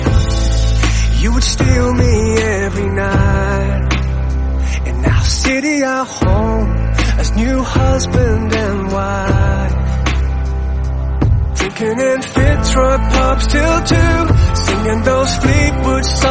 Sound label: soft music song tone ringtone